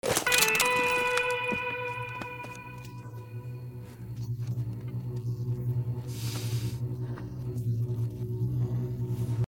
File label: guitar cowboy; rdr2